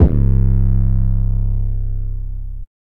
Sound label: 808, Drums, Bass